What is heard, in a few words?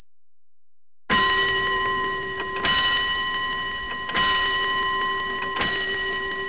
bell; Multimedia Toolkit